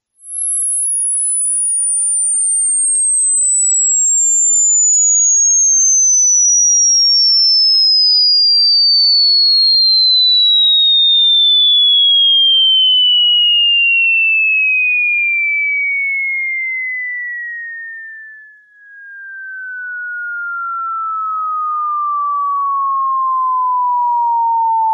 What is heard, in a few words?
annoying squeel